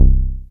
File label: Softy Bass; Best Service Gigapack 2